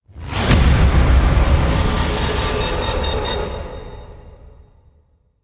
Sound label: tonal impacts mech saw with whoosh